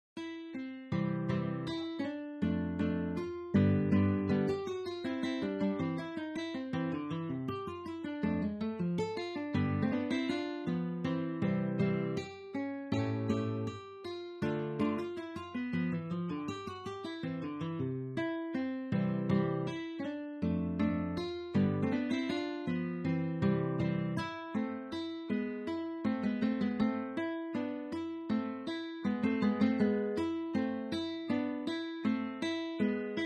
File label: andante